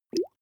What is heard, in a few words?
small bubbles single